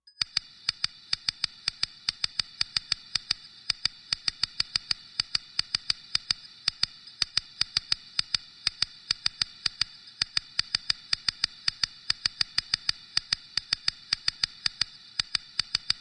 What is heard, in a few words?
Busy Cricket, Machine, Slow Telegraph, Alarm, Reverb